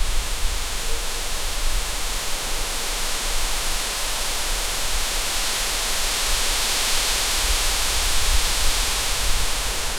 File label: the wind passes through, breeze